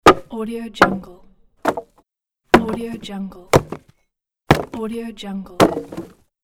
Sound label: hits on wood